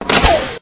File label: door